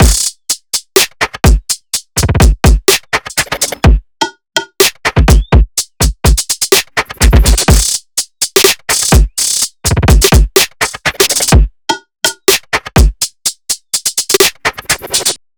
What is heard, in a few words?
drums dry; folda; sentinel av